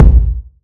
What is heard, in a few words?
low kick one shot G sharp key